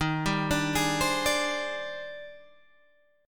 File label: Eb Major 13th, Guitar, Standard; EbM13; arpeggiated; JGuitar